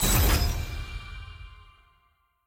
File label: radialmenu role secondary; parties